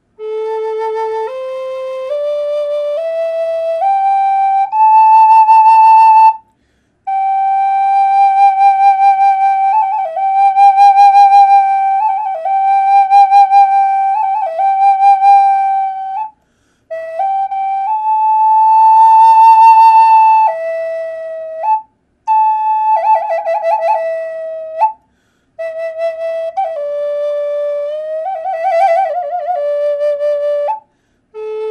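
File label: natural, flute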